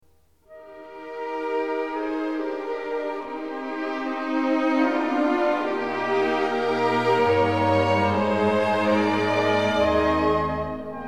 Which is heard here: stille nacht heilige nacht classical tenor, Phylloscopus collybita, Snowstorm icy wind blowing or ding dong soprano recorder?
stille nacht heilige nacht classical tenor